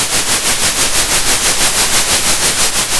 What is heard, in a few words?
center, Sound of a train